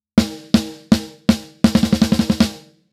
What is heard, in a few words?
snare; Lindell 50 Series